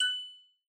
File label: message receive